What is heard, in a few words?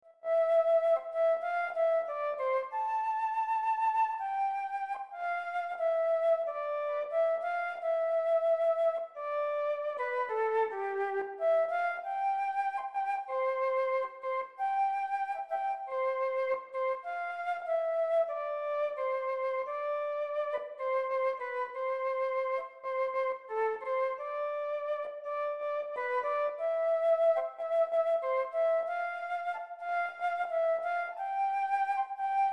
Flute